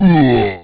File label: troll hit; wesnoth